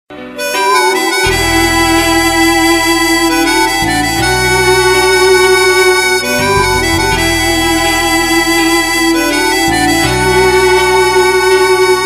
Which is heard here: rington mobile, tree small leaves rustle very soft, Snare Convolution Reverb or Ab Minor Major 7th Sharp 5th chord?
rington mobile